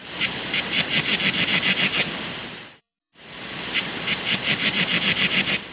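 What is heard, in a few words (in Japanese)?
tsuyumushi; tuyumusi; zukan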